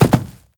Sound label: Grass Jump